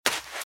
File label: Footsteps - Sand - Dry - Run - Left; RadwinIsland